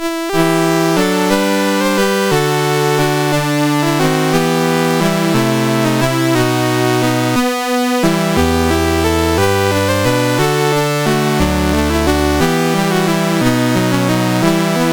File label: Greensleeves, MOS6581R4; sid